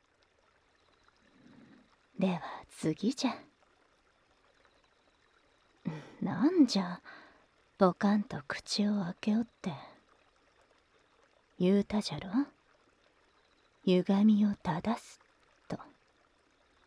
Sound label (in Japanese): 特別な耳かき(ご神木の落ち枝で耳かき), ASMR; のじゃもふ妖狐の千歳さん